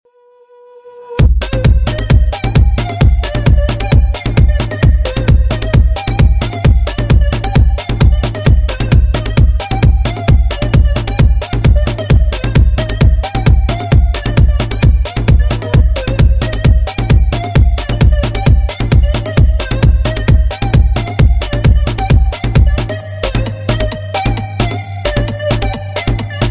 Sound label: Ringtone